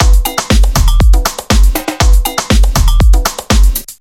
beat

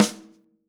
Yamaha Holz L hand

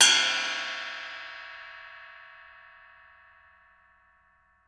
ride lo

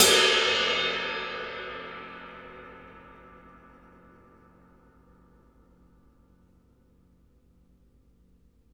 ride Hi

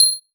bell

